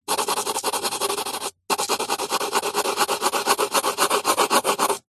office watercolour pencil colouring in shading paper